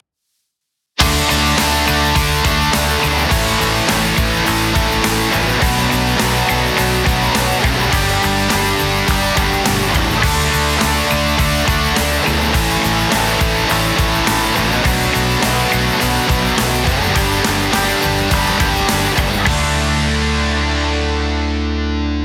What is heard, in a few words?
rock